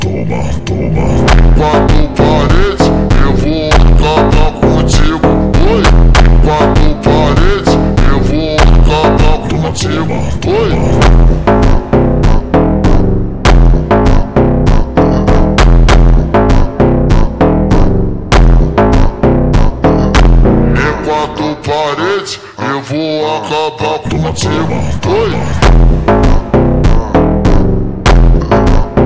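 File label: FUNK Slowed Reverb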